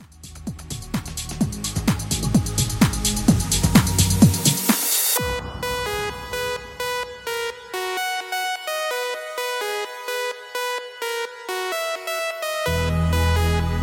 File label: Club Mixshow, 128